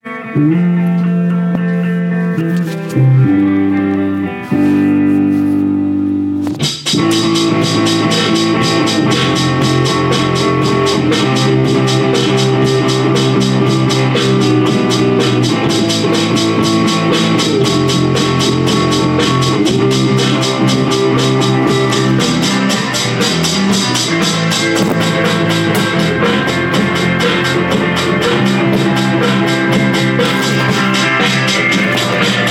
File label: live drum recording